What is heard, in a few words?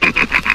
laugh lucifer; ooh ahh